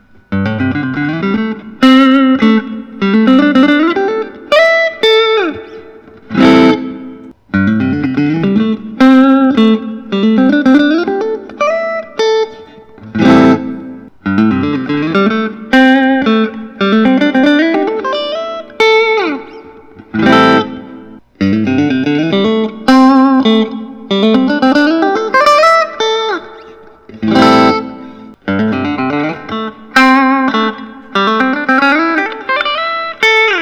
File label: fender masterbuilt; john cruz; wildwood 10 59 nos strat 2014 fiesta red